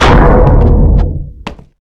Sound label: trap wrecking ball